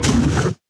stomper death big, enemies; Factorio

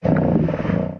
stomper idle big, enemies; Factorio

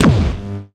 railgun gunshot; Factorio

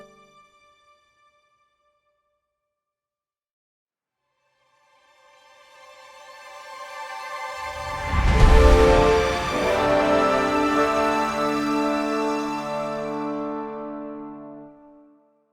FTL Jump Melodies; Helldivers 2

